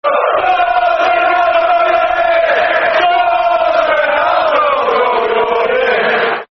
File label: Football Chant (Soccer Song), Man United; Does Ronaldo Know You're Here